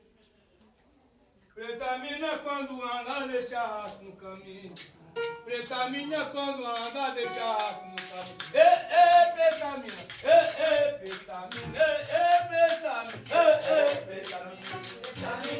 fanti baiao de princesas preta mina; Baião de Princesas é um ritual especialíssimo e único que acontece apenas uma vez ao ano

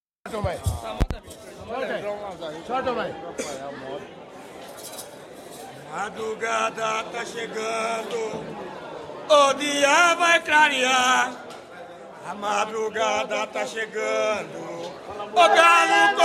batuque de tiete madrugada ta chegando

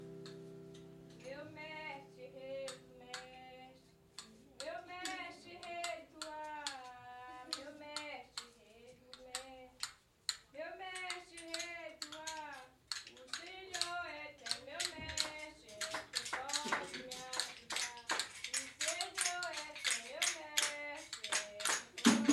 fanti baiao de princesas meu mestre; Baião de Princesas é um ritual especialíssimo e único que acontece apenas uma vez ao ano

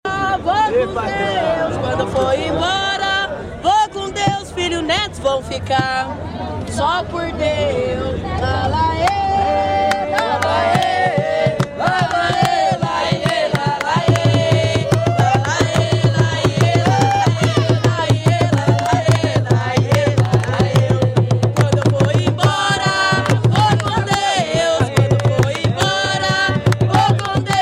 jongo de guaratingueta, jongo; quando eu for

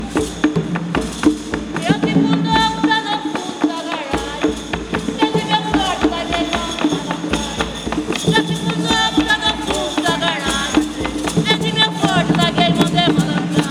batuque de umbigada capivari

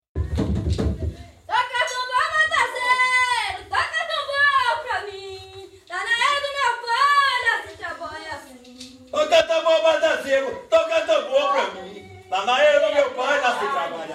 toca tambor batazeiro

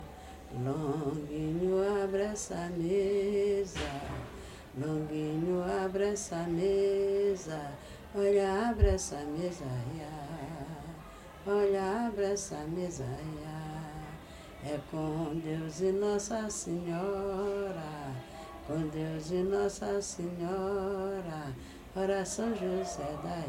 abra essa mesa

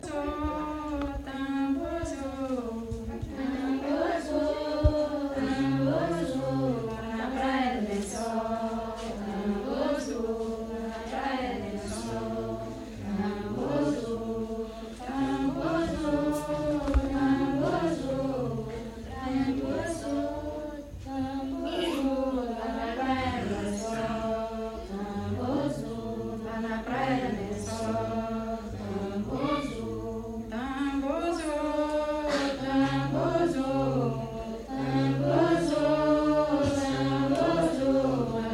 tenda sao jose tambor zuo

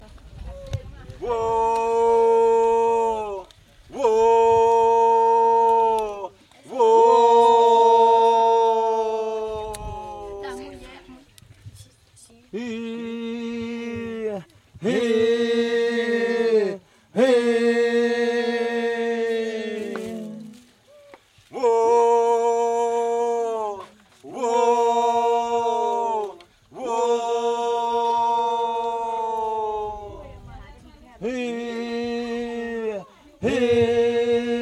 timbiras

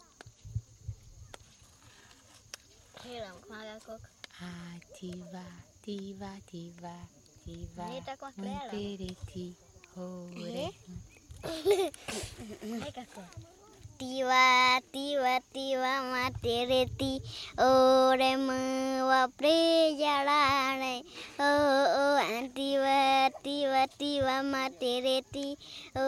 timbiras

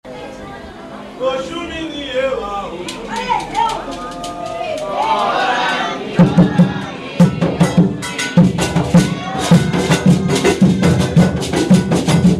terreiro xamba

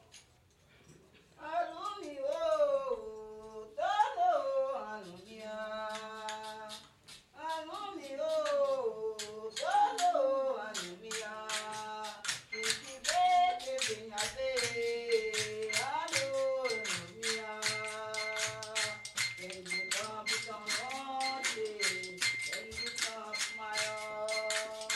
fanti baiao de princesas

